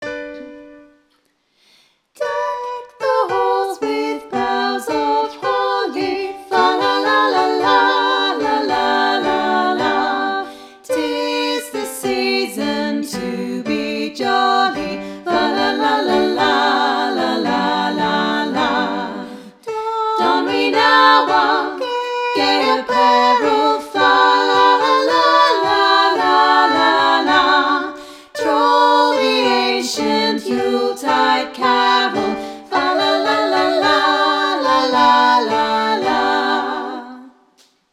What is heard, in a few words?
Deck the halls All vox